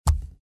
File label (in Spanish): golpea piedra